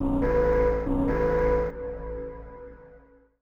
TECHNO EXTRA 140

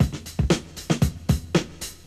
OFFBEAT 116, loop, BPM; 115-121 BPM

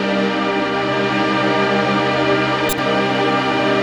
PAD; PADS 2

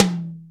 ACUTOM HIGH

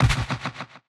Snare, One Shots, Drums

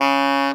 A SAX POR C#2, Alto Sax Portato; Orchestral; VarazdinOrchestral

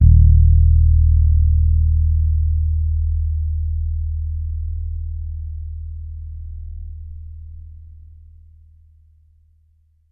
T-BIRD BASS FINGER D#1; Bass Mace; DirectWave

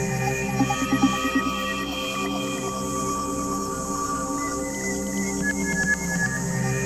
ELECTRO FX 140

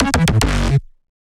Bass

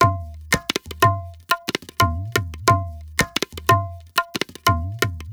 ETHNO EXTRA 90; ethno2

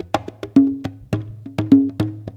CONGA A2 105, PERCUS LOOPS

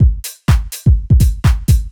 DEEPHOUSE BEATS 125

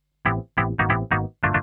TECHNO SYNTH 140; Keys

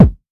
BD

